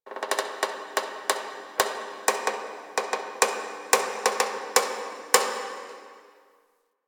Metal Creak Small

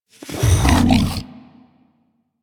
Vocal Breathe Fast; Warframe; Hunting Critters; Undazoa

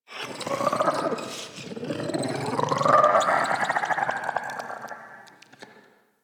Alchemy Lab Create Vocals; Warframe; Hub; Liset Alchemy Lab

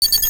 Sniper Rifle Charge Up Ready; Grineer

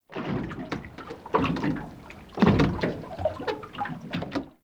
Water Lap Creak Under Dock Slow Deep; Water Laps

